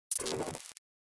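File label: signal glitch; افکت صوتی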